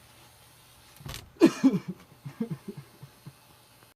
sobbing

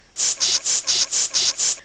man noises

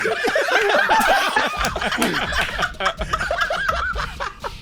laugh track

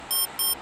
beep beep

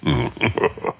laugh; Jabba

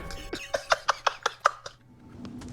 laugh track

